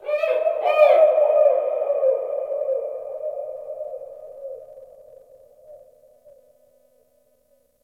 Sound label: Ocean Underwater Creatures Medium; Warframe; Grineer Ocean